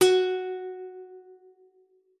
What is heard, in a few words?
F#4, GUITAR; Xsample VOL-2